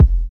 fat bass drum single shot e key, kick drum sample tuned to the E note; kick drum samples